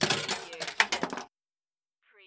Pinball sound, ball launched, rolls and clanks

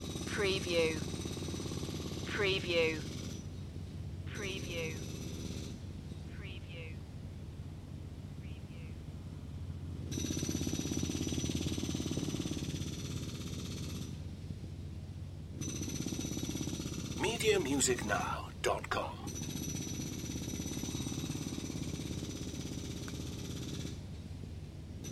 Construction Jackhammer

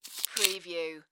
Candy Bar Pick Up; sound effects bible

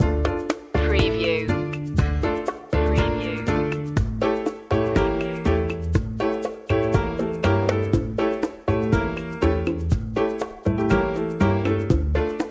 tango de luna loop

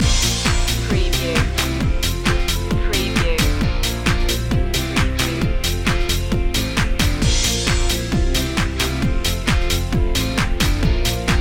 rock the house loop

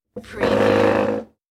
move over chair

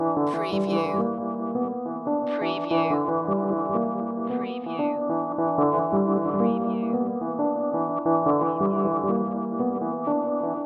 Hip Hop Dark Rhodes Licks 90 BPM Straight - E

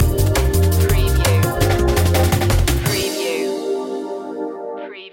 gimme jazz

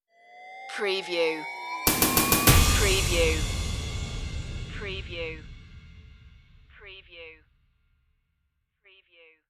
deep impacter stinger

